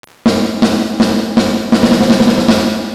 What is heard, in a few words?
SPRINGY CHIRP; XenoVerb